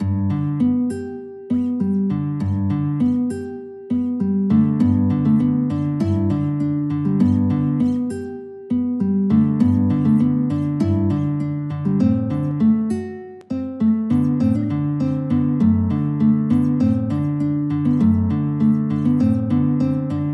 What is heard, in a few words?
guitar